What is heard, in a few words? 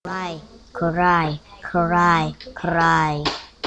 cry